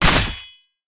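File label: spear, impact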